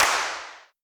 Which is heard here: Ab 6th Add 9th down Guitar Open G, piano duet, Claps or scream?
Claps